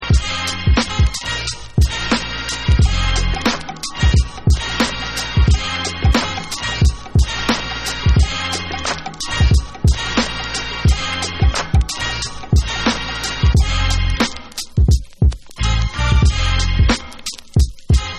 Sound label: Instrumental